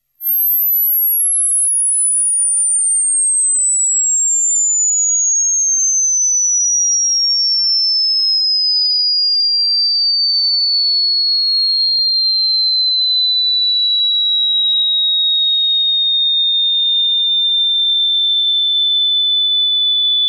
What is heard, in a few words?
Annoying Sound